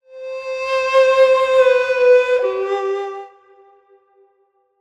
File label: Stinger Woodwind Ethnic; World of Warcraft; Chains of Domination; Tazavesh Stinger Woodwind Ethnic 1